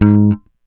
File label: Bass one shot Ab, Electric Bass; Slate Digital BASSHOLE Sample Pack